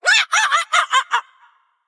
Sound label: Female Beast Trigger